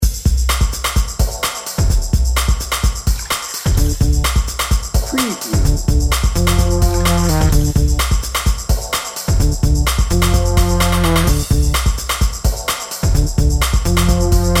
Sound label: busy beats dnb